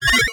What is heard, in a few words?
Science Fiction Sci-Fi Electronic Computer Button